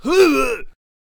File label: Chieftain Attack Vocals